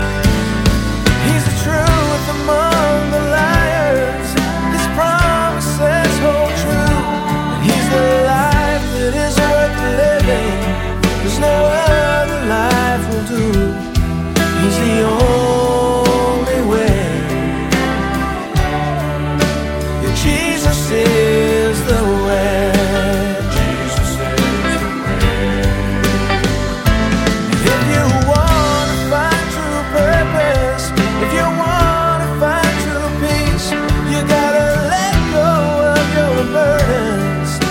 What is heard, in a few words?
chorus ringtone